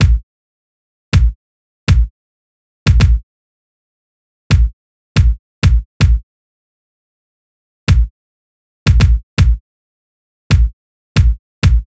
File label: Kick, 080, Cmin, Drum Tracks; Hip Hop Exotica; Big Fish Audio